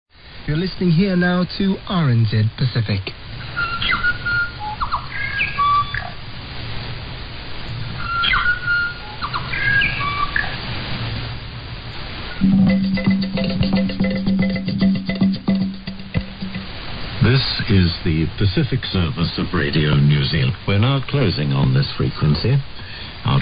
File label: interval signal; rnz pacific